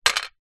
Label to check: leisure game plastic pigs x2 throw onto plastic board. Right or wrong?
Right